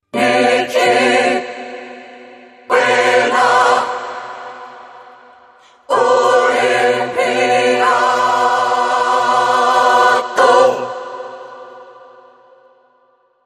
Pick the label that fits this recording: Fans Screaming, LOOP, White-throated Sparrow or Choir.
Choir